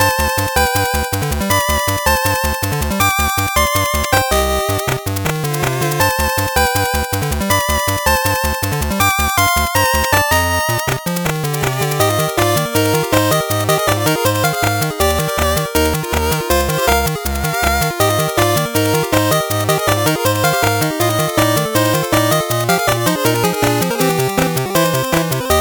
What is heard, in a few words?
8bit bgm fast